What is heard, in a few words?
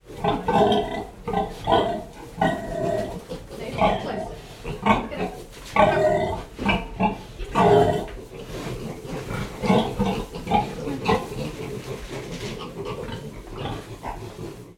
pig grunt groan unhappy female farmer pushes pig to move